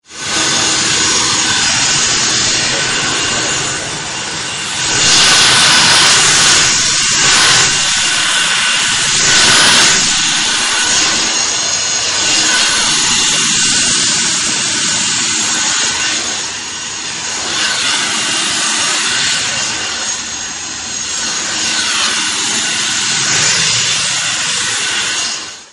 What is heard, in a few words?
Snd Desgn Spin Swten